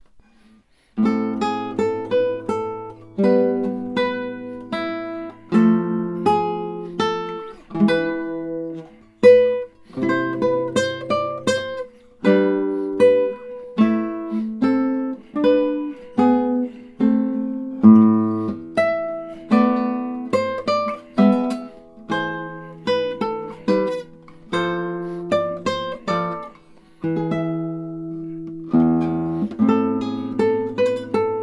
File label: menuet; H.Purcell